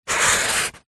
office marker pen draw line on tissue paper

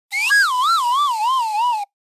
Whistle Descend; noise creations

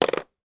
industrial tools alum key put into tool box; sound effects one

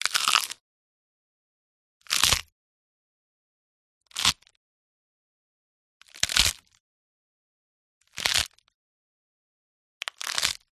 crunch fast cabbage; audiotank

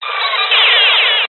science fiction radio signal interference burst

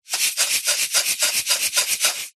household epsom salts glass jar shake; zapsplat

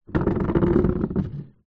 household chair plastic drag wood balcony

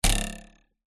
cartoon bounce jump ruler twang

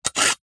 household knife sharpen in sharpener

